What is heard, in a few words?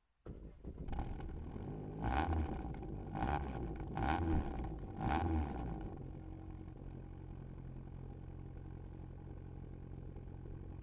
after cold driver side